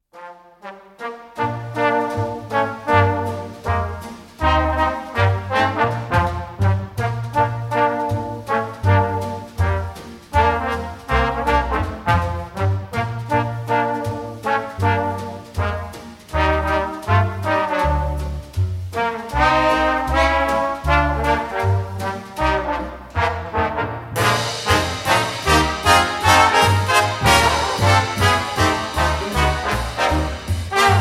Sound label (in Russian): estradnyiy orkestr